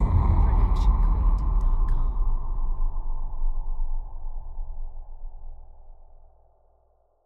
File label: trailer hit distorted; soundscrate